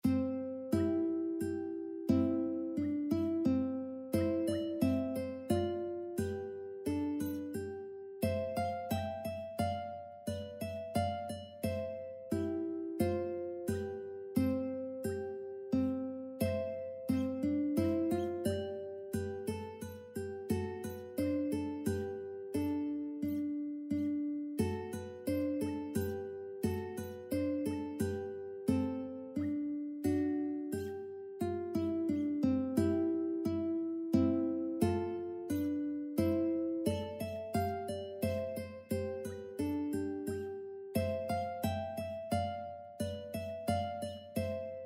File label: ukebook minuet